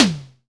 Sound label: Royality free snare drum tuned to the D# note.; acoustic snare sample d sharp key; snare drum samples